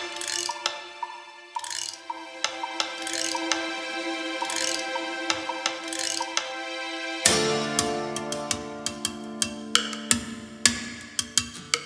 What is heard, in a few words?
Merchant Menus Loop; Eidolon; Cetus